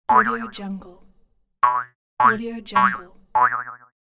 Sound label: cartoon jump